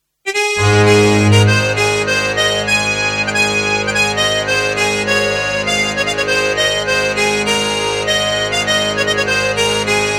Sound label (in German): Dudelsack Schottisch